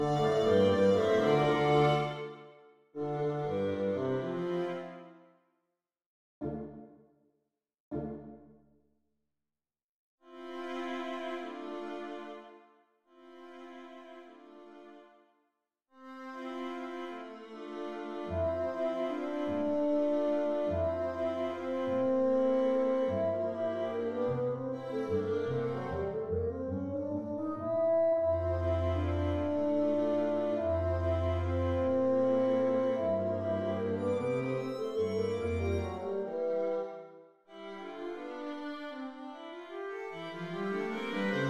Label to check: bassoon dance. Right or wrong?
Right